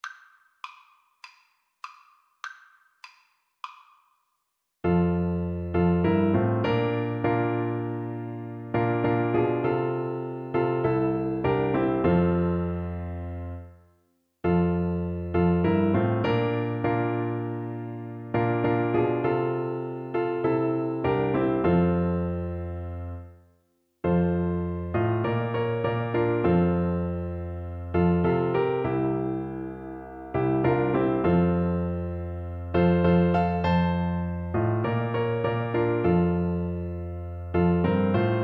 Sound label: Oboe